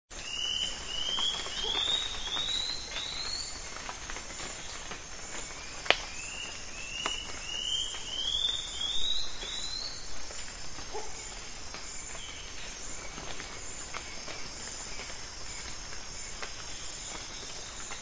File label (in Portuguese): Canto do anambe militar